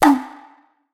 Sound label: small hand drum strike